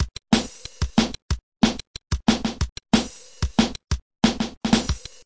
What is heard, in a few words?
pressbronx, DRUM LOOPS and BREAKS, 185 bpm